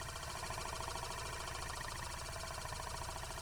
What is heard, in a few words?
reel spin